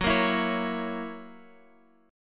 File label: Gb 7th Gb down Guitar Open F; strummed; JGuitar